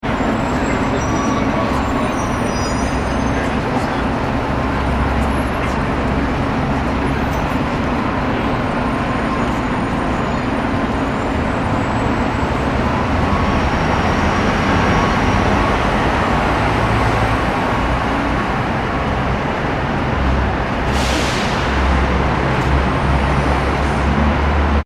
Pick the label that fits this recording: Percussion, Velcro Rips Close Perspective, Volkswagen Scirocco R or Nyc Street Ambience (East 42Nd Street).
Nyc Street Ambience (East 42Nd Street)